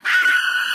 Scream F#5; 音MAD; 孤独摇滚; Melodyne

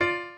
piano

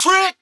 vox

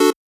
Chord

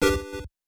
Error; 主播女孩重度依赖

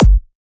Dr Kick

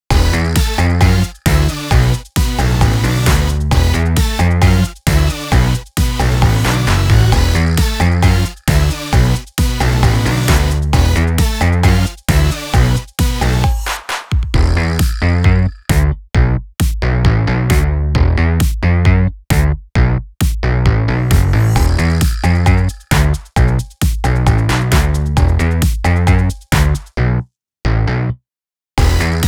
off vocal

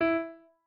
piano

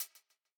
hat

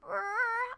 Rrrrrrrrrrr; Momiji

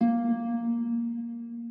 harp

